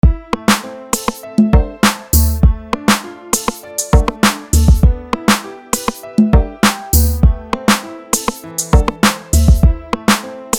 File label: Beat, Instrumental, Freebeat